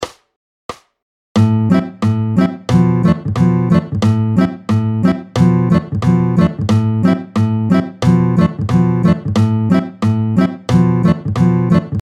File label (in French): Pompes 2 Vite 2 2 tempo 180; 16-07 La pompe 2. Vite, 2/2 tempo 180; Tempos rapides